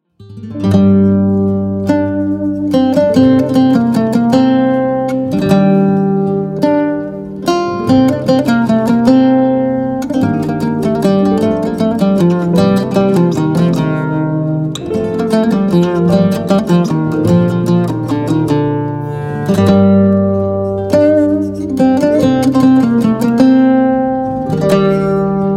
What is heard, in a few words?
Music Oud Bikalam